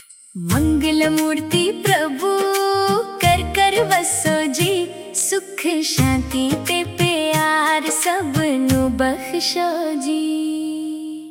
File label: punjabi ringtone; ganesh